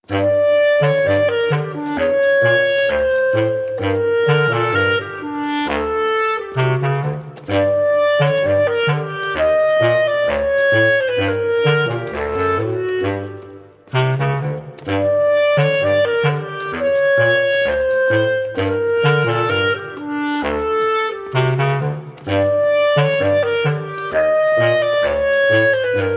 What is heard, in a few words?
Winds Only